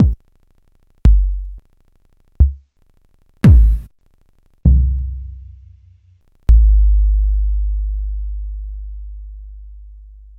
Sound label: bdrums